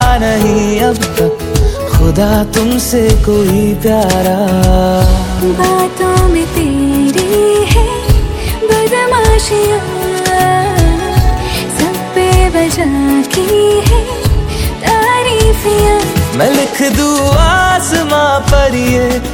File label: bollywood, ringtone